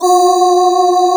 SPACE VOICE; Greytsounds; Scott Peers Sound Engineering

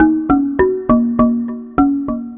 Marimba Low

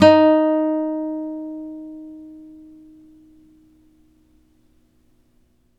NYLON F D#3, GUITARS; Synclavier Sampler Library

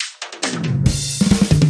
Fills, Loops 140 BPM

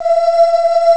string; Amiga Soundtracker Sample Packs

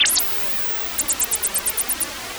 Juno-60, Filtered Noise

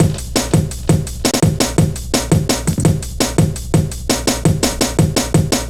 Step Ez, Drum Loops, Jungle, 168.5; Zero-G Jungle Warfare 1, 2 & 3

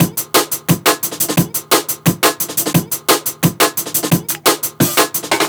Heli Blow A 175, classic dnb breaks

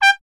TP G; Miroslav Vitous; Solo Instruments VOL-1

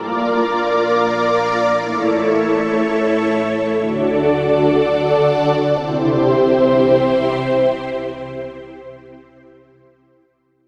Cinematic Pad; Dr. Fink's Funk Factory

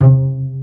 pizzi total, stringed; East West; Global Sounds

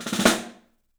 STEEL ROLLS; 3D Drums

